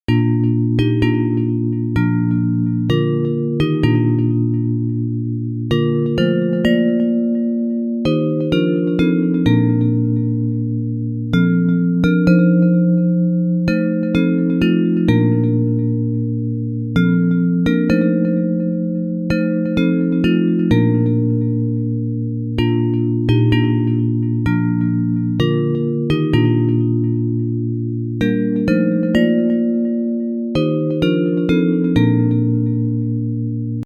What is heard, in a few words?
Bells, Hymns